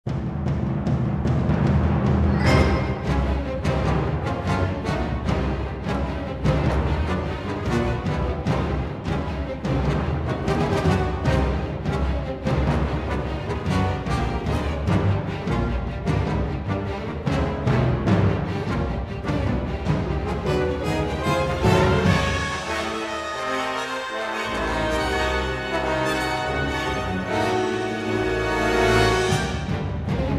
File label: Tension Loop; Star Wars Battlefront II